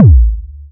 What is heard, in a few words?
Kick